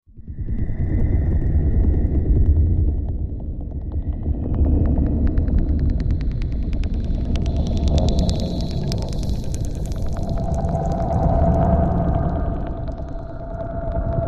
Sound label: Vibrating Wind Low Vibr, cave